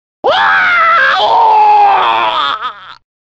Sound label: crashed scream; armageddon; liu kang